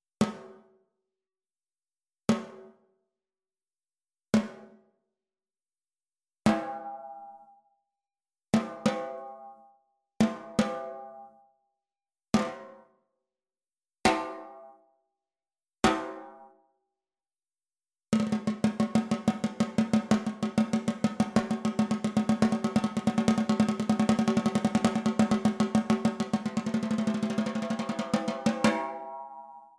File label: ACROLITE ALU 14X5, CAISSES CLAIRES